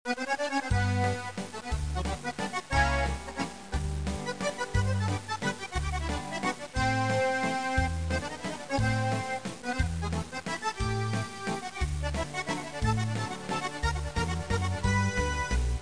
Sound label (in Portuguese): trilha; GEOGRAFIA